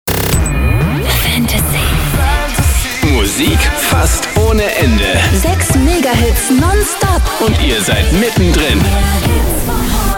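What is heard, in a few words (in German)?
Hits am Stück Musik fast ohne Ende DANCE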